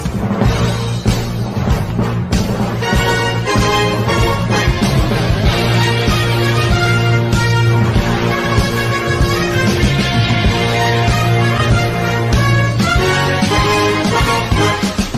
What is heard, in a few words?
horns; sunday night football